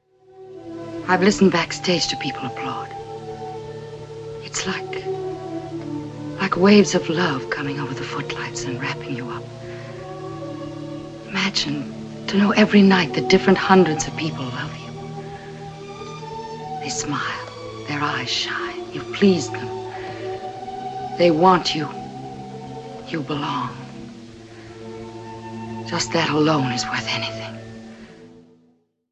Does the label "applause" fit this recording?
Yes